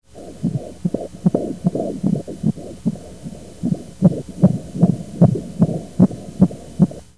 baby beat, at 23 weeks

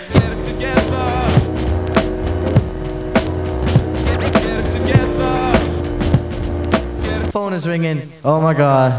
phone is ringin

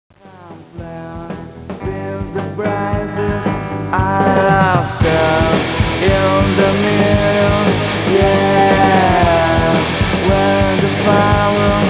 Maracas

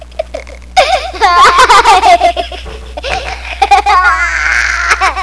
a laugh; 5 yrs old; Autism

